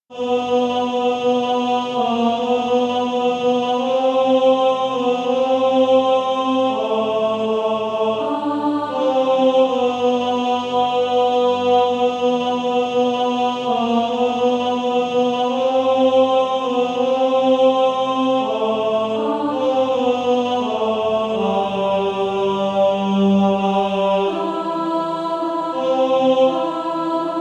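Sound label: Male Choir, tenor 1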